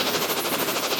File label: charge loop; CrossCode; battle